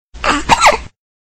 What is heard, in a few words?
Monster Death; fdfish